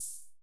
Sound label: 808 Hat Open; drumkits; hydrogen; TR808EmulationKit